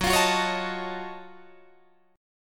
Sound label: Gb Minor Major 13th Gb down Guitar DADGAD